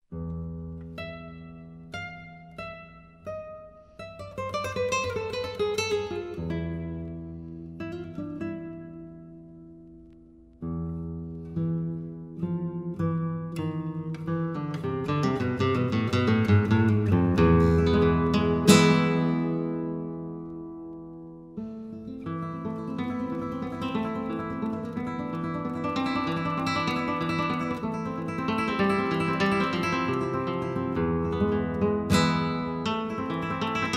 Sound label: Amazing Chill Guitar; CHILL OUT SUAVE